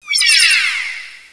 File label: declocking